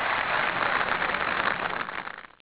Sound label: reversi won; kreversi; games